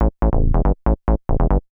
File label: TECHNO BASS 140 A